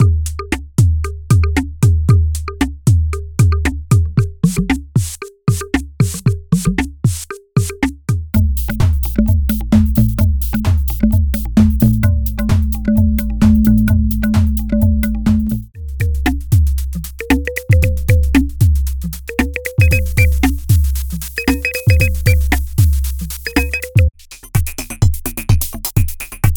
Drum Loops; Tassman4

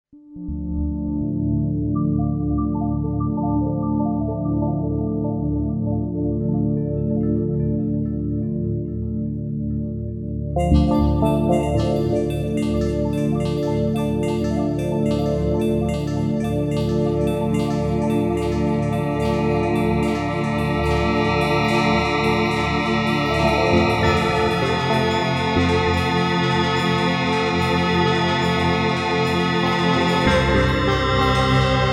Molecular, yamaha sy77 pads

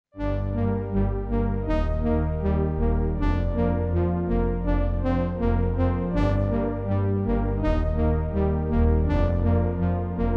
DRY Analog, pads, yamaha sy77 sy99

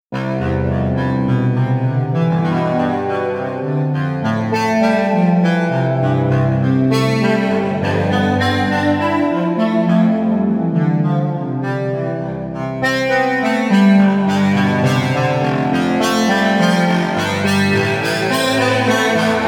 StringZ baroque

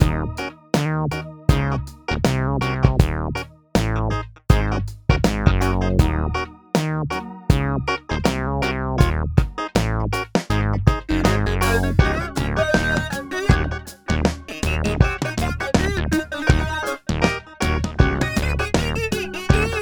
Funky Funk; alchemy; himalaya vintage

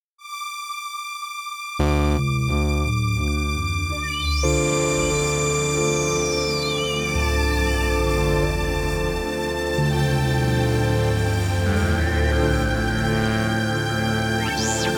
Downtempo Wind

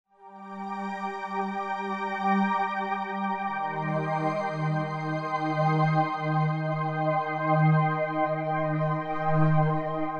Pastel Pad, yamaha sy77

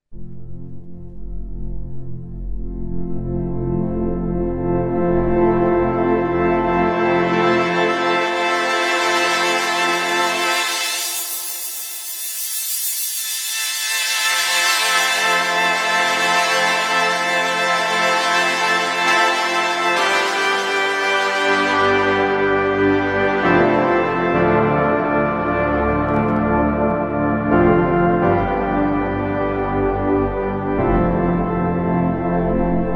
Two Colds, yamaha sy77 pads

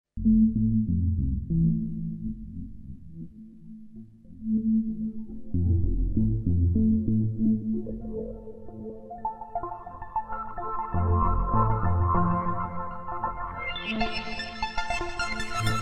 Moth Wave, yamaha sy77 pads

